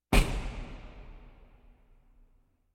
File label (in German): parkhaus schliessen einer autotür; Großstadtgeraeusche